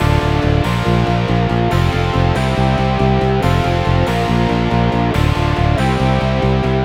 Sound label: 140BPM D; Vengeance - Essential Clubsounds Vol.4